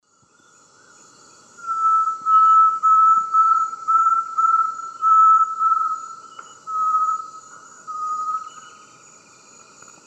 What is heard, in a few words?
Tinamus major, song; S. America; Eastern Lowlands